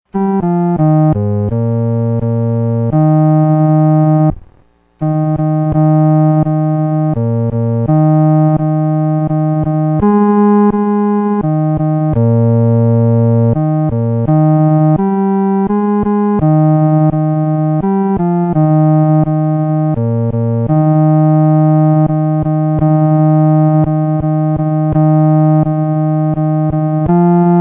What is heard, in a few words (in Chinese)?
独奏 第四声